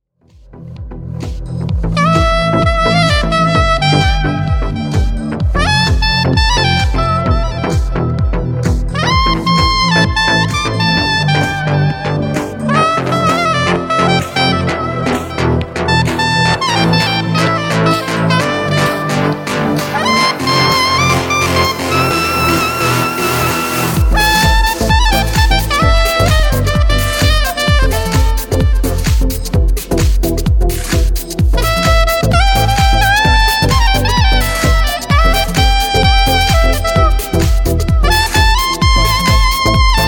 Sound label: house sax; awi